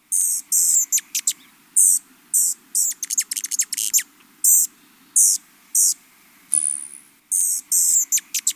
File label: rufous humming